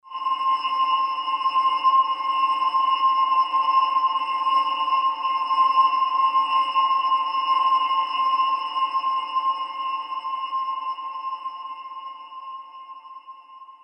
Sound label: Envelope 1 Bar; Elysion 2; Krypton Surface